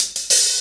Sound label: Cymbal Pat; Zero-G Jungle Warfare 1, 2 & 3; 90 s Sample CDs